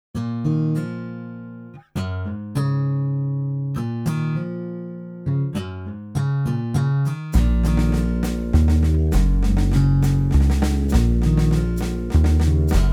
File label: Pop Ballade Double; DPA